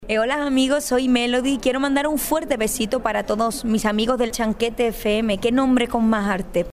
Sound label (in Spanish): SALUDO MELODY